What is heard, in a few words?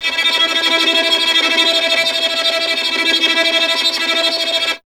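string violin tremolo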